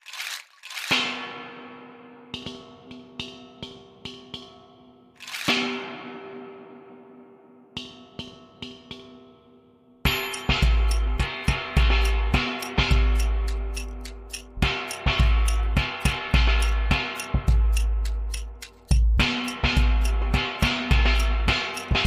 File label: Recycle Style, Reduced